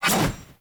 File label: Sword Whoosh Heavy; Weapons; Player; Swords; Garren Sword